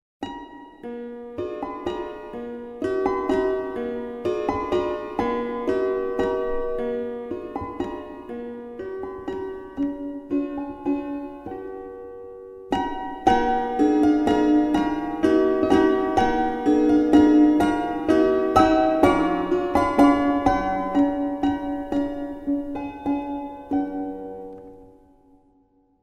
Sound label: Orphica Piano; Schubert